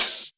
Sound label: Open HH, Cymbals